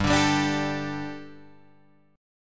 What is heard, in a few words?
G Suspended 2nd, Guitar, Drop D